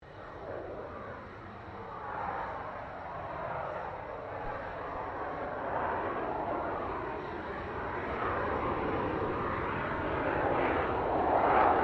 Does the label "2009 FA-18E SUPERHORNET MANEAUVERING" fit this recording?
Yes